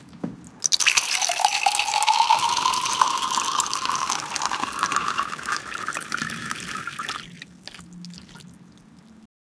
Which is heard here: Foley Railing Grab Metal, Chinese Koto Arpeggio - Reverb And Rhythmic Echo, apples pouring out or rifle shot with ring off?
apples pouring out